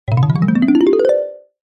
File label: cartoon ascend climb med mallet; zapsplat